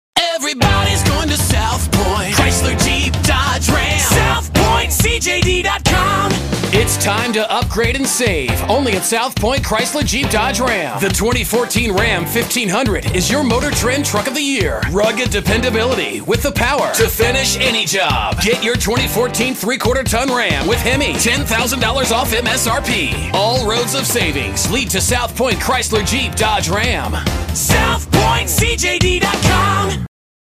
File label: jingle; SOUTHPOINT DODGE; jingle samples